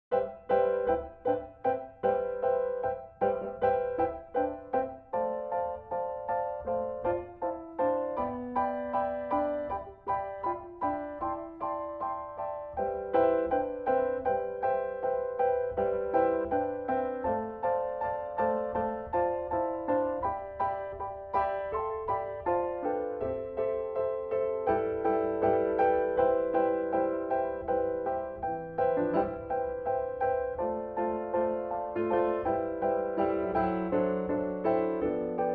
Jingle Bells

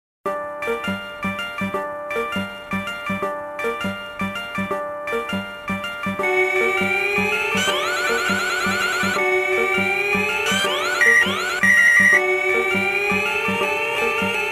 Automatic Music

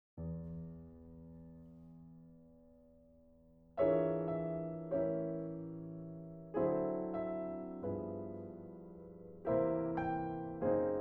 Jingle Bells

